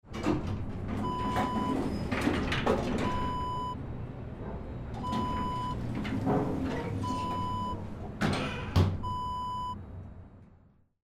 elevator door open close

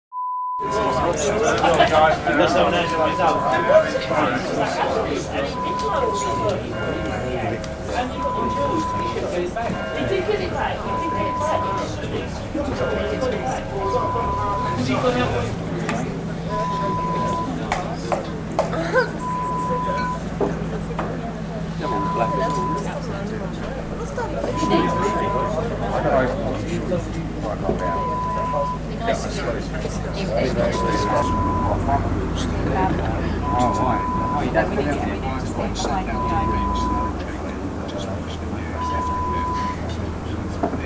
English country pub sound

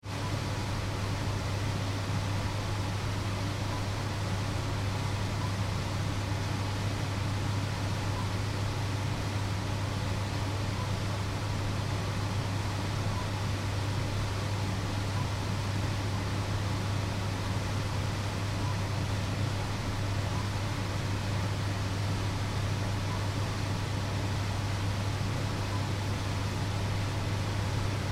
Machine Room Ambience

